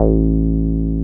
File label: 303 SQR A2 MP, SQUARE BS